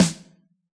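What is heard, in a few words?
hip hop kit snare; Dr 202 Kit 1; Percussion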